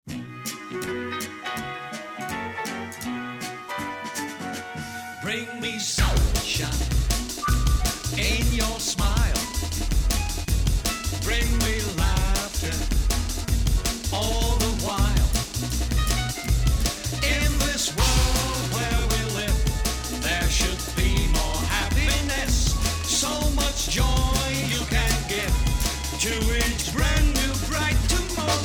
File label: drum and bass, Beatbox